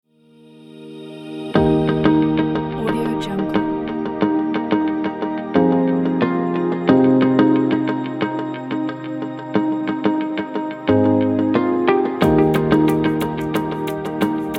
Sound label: ambient music